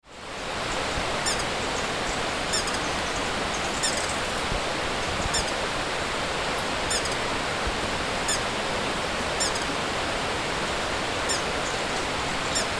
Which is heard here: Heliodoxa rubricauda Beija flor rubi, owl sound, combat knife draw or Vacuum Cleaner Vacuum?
Heliodoxa rubricauda Beija flor rubi